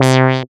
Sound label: SYN MC WAH, Roland MC-202